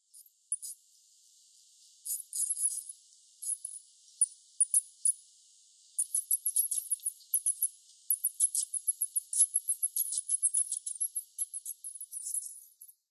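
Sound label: hinakomori, Asian Parti-coloured Bat; wakayama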